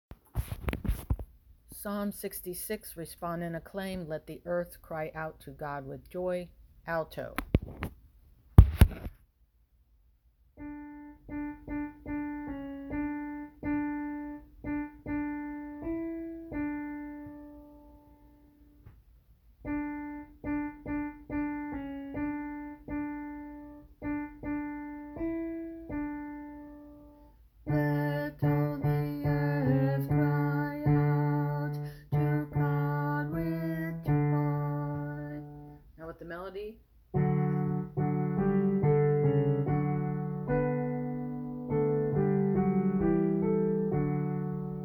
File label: alto; Ps. 66 Let All the Earth Cry Out